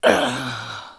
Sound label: death; mohaa; dialogue